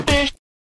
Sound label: fish shout